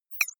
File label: click synthetic light tonal, signale